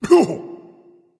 grunt hit; smite; voicepack; ymir

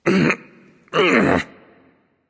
lift; smite; voicepack

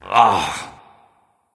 "ugh"; smite; voicepack; sylvanus

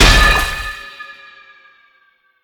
waterball trident impact; smite; poseidon

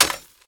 weapon stab ground; Smite; Sobek

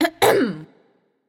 clear throat; Smite; athena

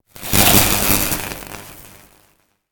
projectile; smite; god raijin

